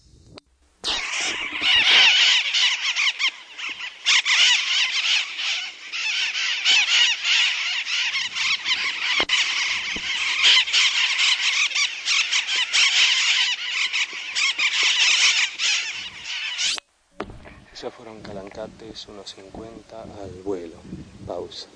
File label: Blue crowned Parakeet Thectocercus acuticaudatus